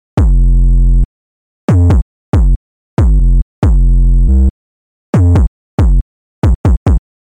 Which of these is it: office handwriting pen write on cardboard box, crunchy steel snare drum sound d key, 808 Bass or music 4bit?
808 Bass